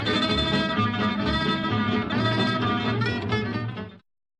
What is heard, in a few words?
tremolo picking 2 strings; django